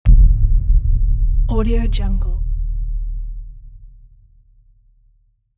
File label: cinematic sonic boom